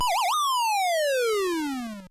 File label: knockdown; punch-out arcade